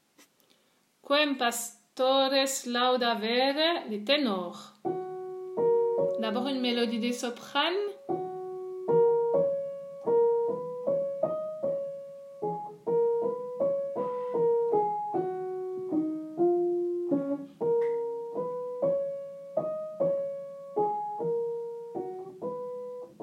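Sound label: Quem Pastores Laudavere ténor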